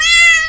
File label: normal hit clap; osu!